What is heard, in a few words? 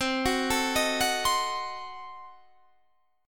C Diminished 7th C down Guitar Standard; arpeggiated; JGuitar